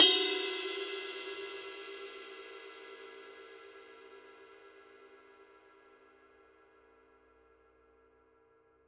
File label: formula 602 ME 22 ride bell; modern essentials